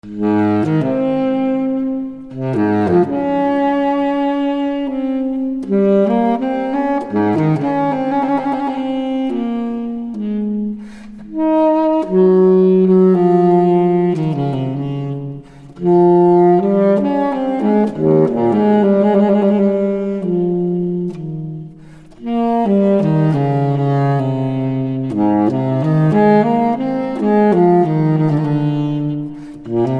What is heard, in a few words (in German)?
sarabande baritonsaxophon